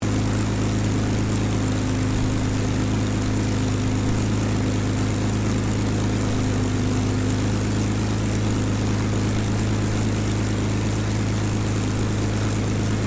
AIRCRAFT SPITFIRE ENGINE OPERATING, Rolls Royce Merlin engine; Airplanes; Vehicles; Airplane Sound Effects